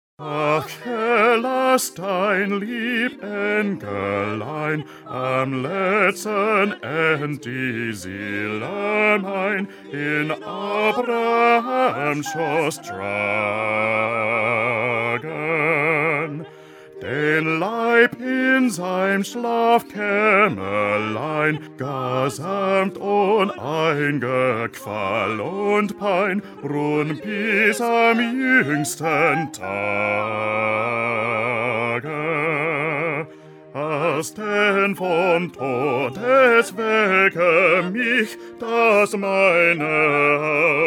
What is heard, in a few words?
Bass